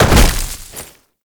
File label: Land Heavy Vegetation, movement; Warframe; Uriel